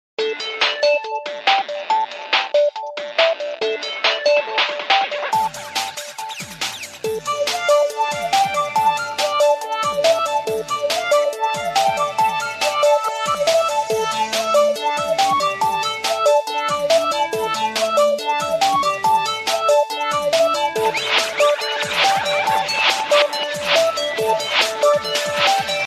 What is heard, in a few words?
Samsung Ringtone; ringtones